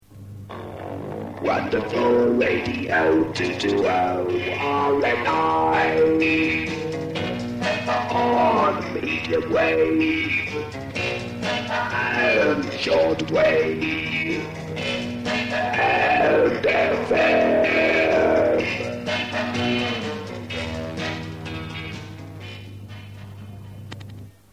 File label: jingles